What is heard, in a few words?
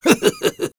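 laugh happy, Spanish; Risa Feliz 2; Sniper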